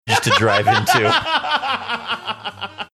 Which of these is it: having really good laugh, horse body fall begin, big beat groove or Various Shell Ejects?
having really good laugh